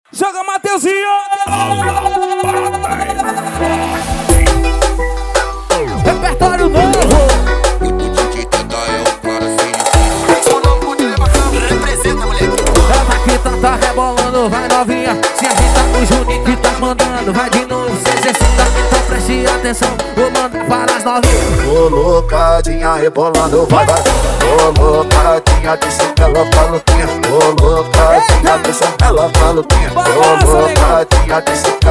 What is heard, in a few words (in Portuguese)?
piseiro hawaiano